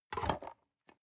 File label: storage lid slide close hard, box; objekte